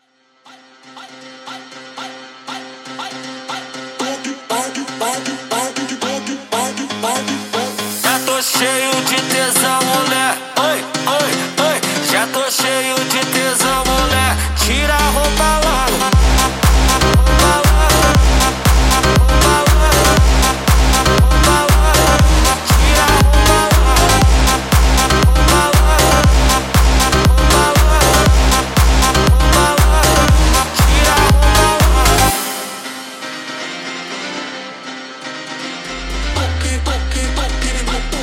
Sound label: Funk sped Up